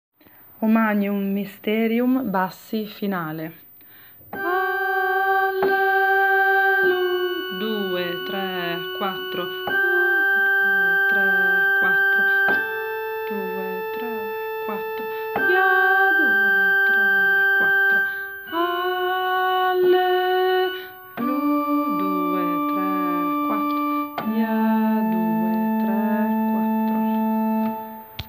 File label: O Magnum Mysterium bassi finale